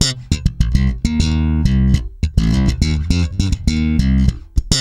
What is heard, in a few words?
C, hard funk, bass; Spectrasonics; Bass Legends